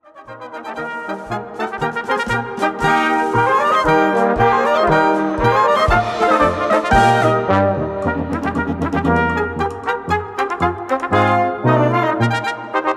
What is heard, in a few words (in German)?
marsch; florentiner